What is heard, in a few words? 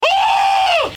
Wooh